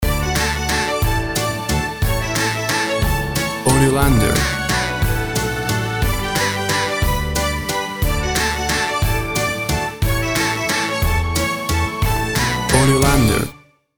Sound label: Waltz for Kids